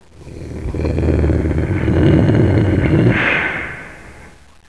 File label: steam